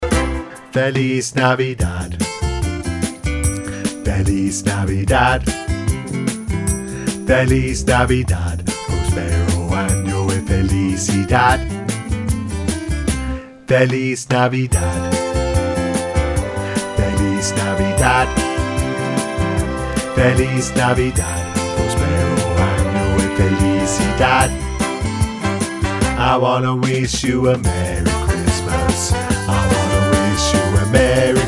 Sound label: Bass, rehearsal parts christmas